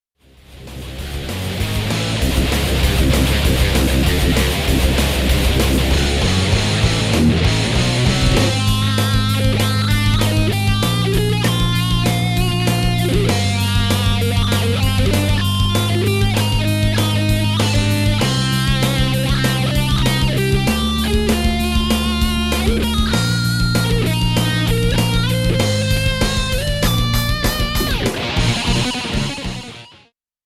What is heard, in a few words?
WAH